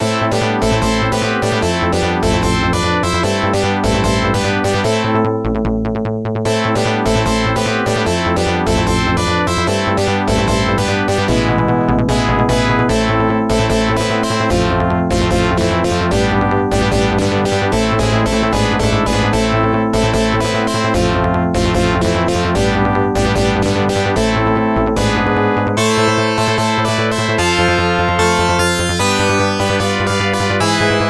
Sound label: Ship Battle; 1943 The Battle of Midway